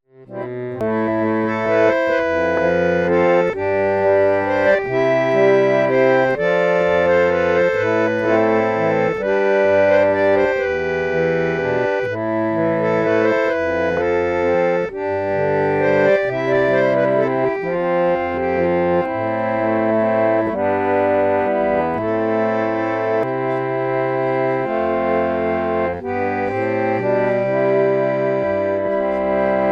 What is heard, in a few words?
organ part